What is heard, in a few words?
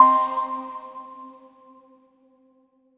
Cmin - collision; Color Zen Kids; 3DS, Android, iOS, mobile, Switch, Wii U